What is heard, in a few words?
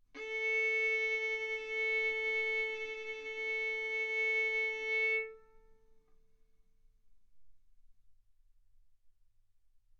Violin arco mf sul A A4 B4 stereo